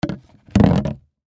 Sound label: Juice Container, Foley Juice Container Empty Set Down On Side Wood Table; Plastic Jug; Kitchen; Blastwave